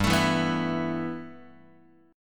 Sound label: G 6th Add 9th G, Guitar; G6add9 strummed